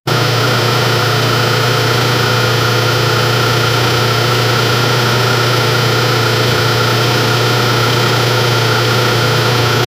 jeep engine running

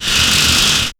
farmer mining wheat from; farming

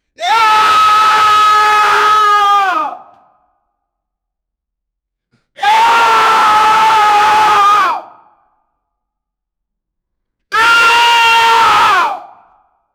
man fry screaming with li, screams; sound effect

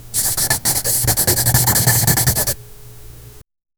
a single slide of chalk

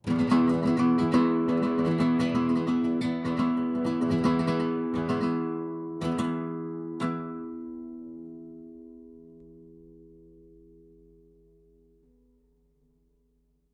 acoustic

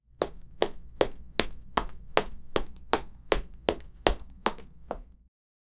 just the sound of walking, footsounds

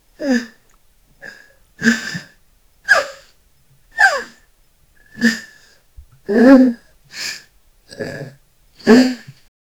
person struggling to danc; effort

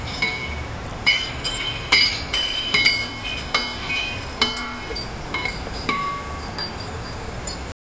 vast granite quarry; cliffs

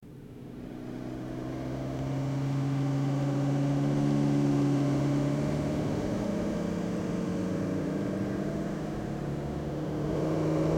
honda shadow 750 phantom, road

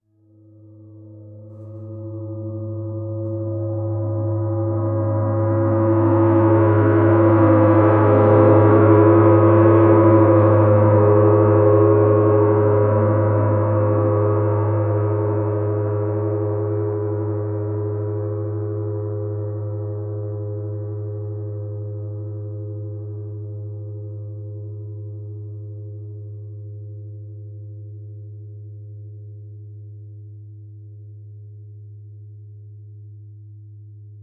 breath gong single soft

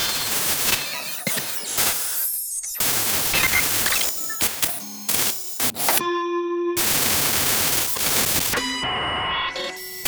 cyber glitch fx

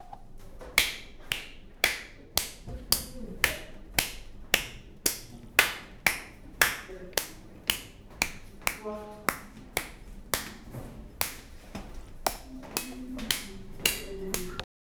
looping fast body slaps w; sound effect; body impact

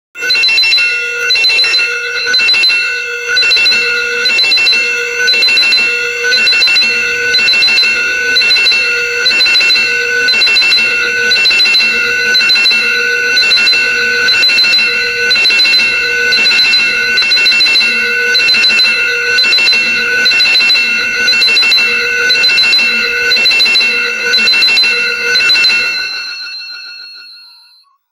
fantasy bell ringing with; alarms

